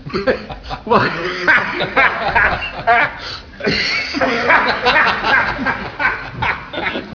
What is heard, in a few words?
bahaha